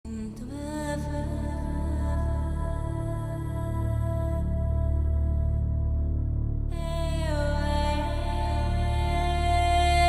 horror bgm

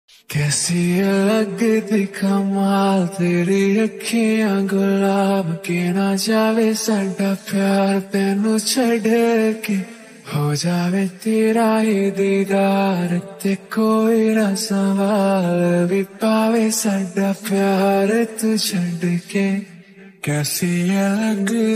ringtone bgm

